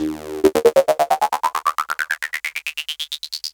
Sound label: arp loop; Best Service ProSamples vol.10 - House; AKAI